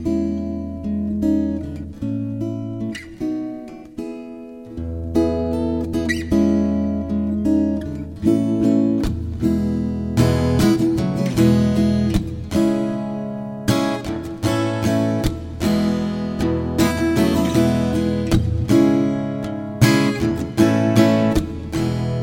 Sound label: acoustic